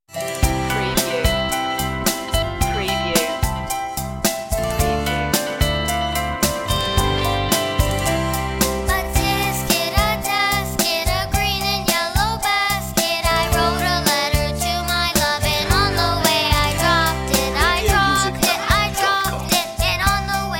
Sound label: a tisket a tasket child solo vocal